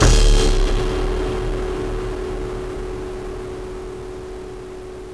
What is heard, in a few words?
skele attack